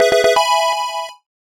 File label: level up